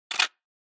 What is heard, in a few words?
Camera Film